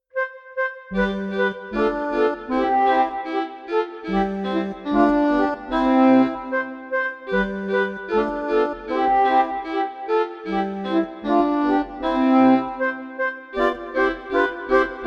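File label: Air de danse Accordion Flute Violin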